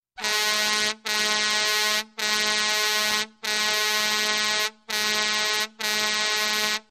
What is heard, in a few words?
Error Blast Sms